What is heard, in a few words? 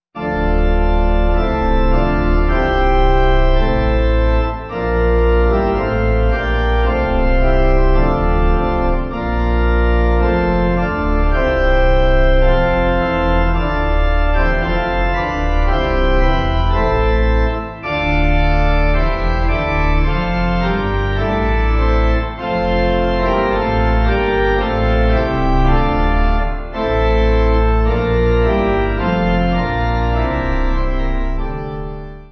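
Pipe Organ, Music Backing Files for Churches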